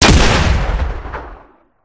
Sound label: gunstand shotgun fire, turret; gow; turret judgement